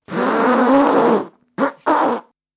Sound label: Nose Blow, Sound of man blowing nose.; Sick (Sound Effects:Human)